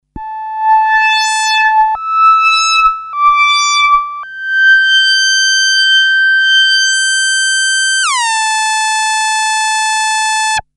Korg M-500SP Synthe Traveler; Synths

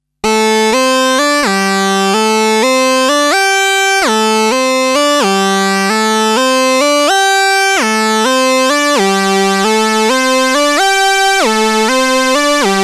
Jen PWM, Jen SX1000 Synths

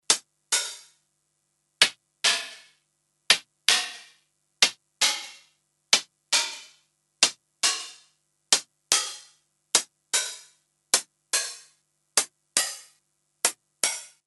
Roland TR-909 HH Mod Tune; Synths

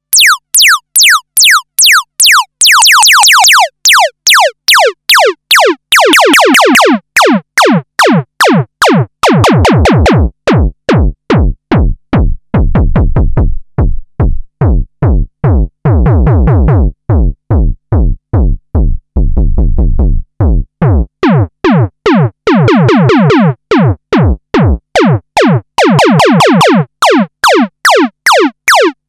Transcendent 2000 Filter Drums

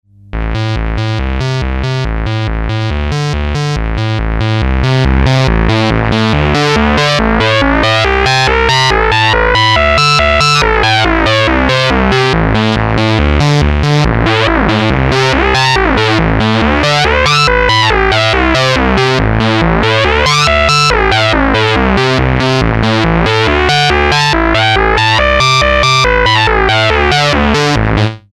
Moog Realistic MG-1 Sync In Detune

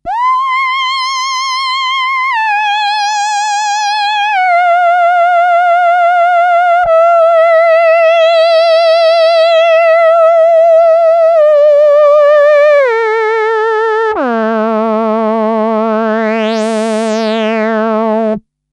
Korg M-500SP Synthe Voice Mod Traveler Porta; Synths

